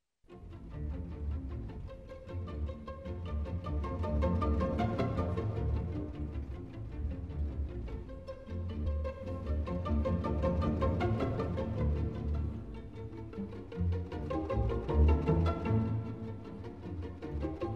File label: Scherzo. Pizzicato ostinato